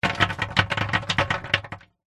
horror coffin small stones dirt handful throw onto coffin box being burried; internal coffin